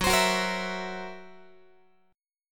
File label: Gb 7th Flat 5th Gb Guitar; x,14,15,16,14,15; Listen to Gb7b5 strummed